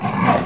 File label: LION, animal; 动物